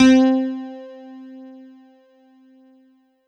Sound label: Casio CTK-611 Electric Grand Piano C4